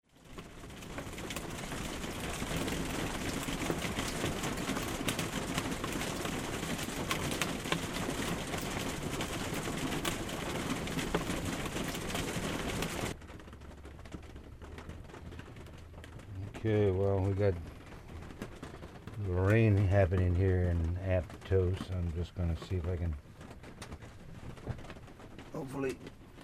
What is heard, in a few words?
Rain Thunder Hail Storms, Olofonia